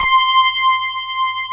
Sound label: FM ELP C5 R, Keyboards Old School; USB Soundscan vol.09; AKAI; FM ELP 3